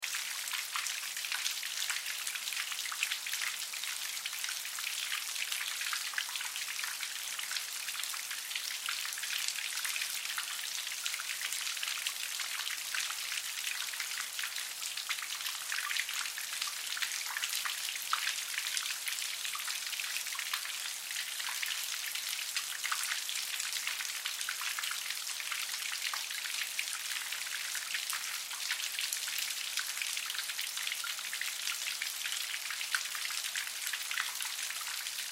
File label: Rain Alley Awning Water Drips Splatter Loop; Weather; Rain Sound Effects; Blastwave